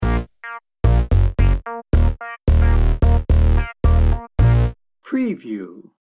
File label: fresh fun sting bumper